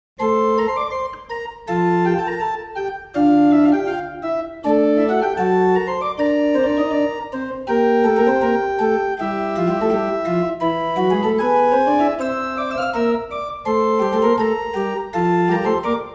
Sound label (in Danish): etude orgel